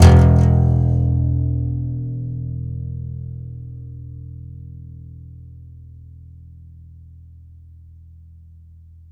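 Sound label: contrabass balalaika, Plucked Strings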